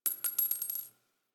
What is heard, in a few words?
Target Practice Shell Casing; gameplay; Warframe; Nineteen Ninety Nine; Quincy